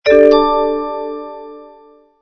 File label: you lost